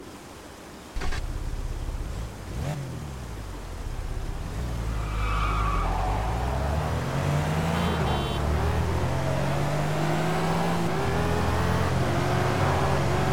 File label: audi s4 cabriolet; TDU-1